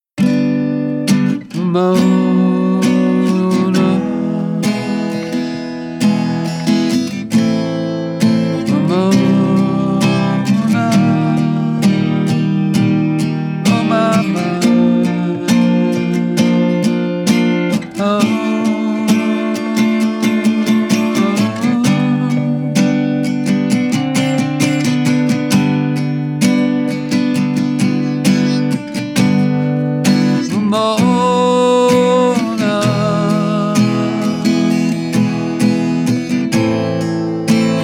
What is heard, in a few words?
(Acoustic Version); Scott Pilgrim vs. The World [2010]